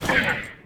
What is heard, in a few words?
PEACE DAMAGE